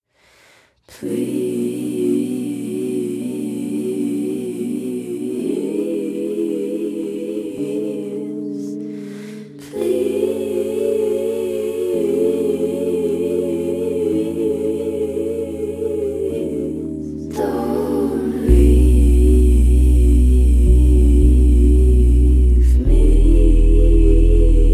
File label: vib